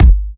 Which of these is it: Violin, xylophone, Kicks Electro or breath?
Kicks Electro